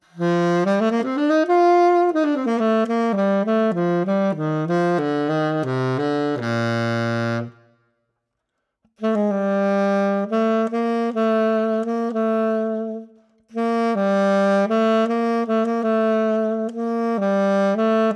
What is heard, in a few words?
TAROGATO BASSO; timbri